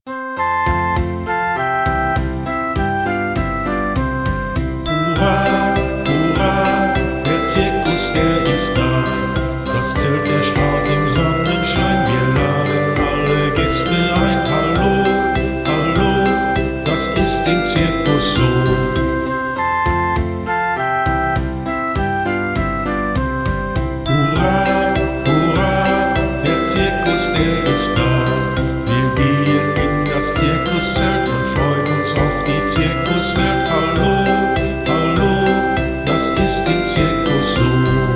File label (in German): zirkuslieder